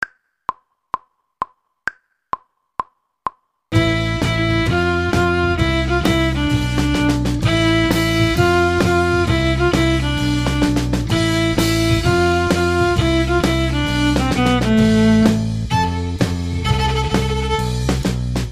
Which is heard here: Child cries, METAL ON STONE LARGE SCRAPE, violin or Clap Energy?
violin